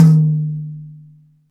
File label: DM5 Perc, Alesis DM5 drum machine